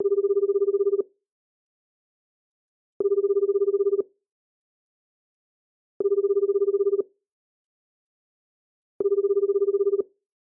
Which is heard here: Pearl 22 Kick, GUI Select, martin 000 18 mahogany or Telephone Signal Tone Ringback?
Telephone Signal Tone Ringback